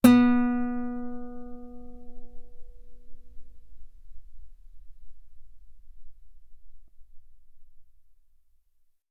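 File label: Guitar ff B string B3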